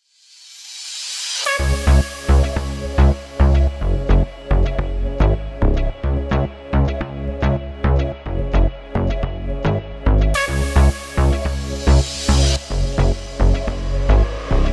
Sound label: deep house; by burak sound bank; deep house vol 8